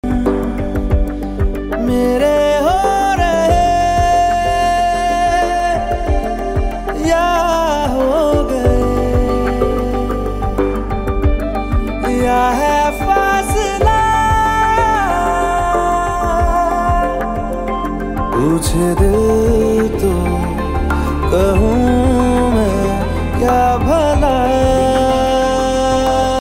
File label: song ringtone; laila majnu